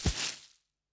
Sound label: Footstep Grass; Opsive; UltimateCharacterController